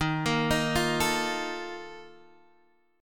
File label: Bb Major 7th Suspended 4th, Eb, chord